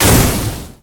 skill fire explode; agar agar

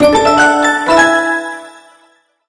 Chest Unlock Start Again Again; Cookie Run

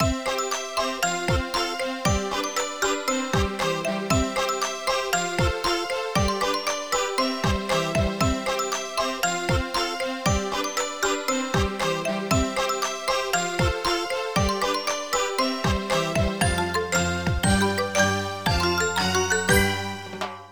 Bgm ui shop

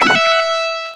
Note Spreads Normal; RockStar

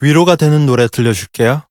levelup; cookie run

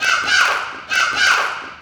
Cheer Leader Friends; cookie run

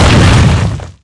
Meteo Impact; Cookie Run; PDragon

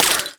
Shot Rifle; Ranger

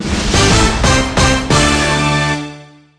Duel loading jingle; cookie run

